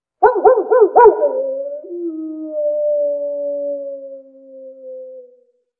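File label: animal wolf distant; amb elements; modern warfare 2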